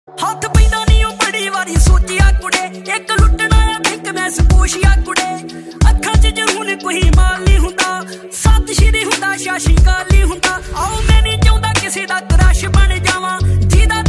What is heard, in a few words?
new punjabi song ringtone